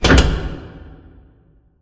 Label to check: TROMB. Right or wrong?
Wrong